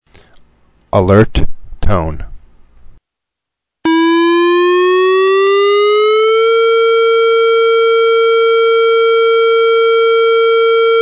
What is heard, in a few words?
tornado warning alert tone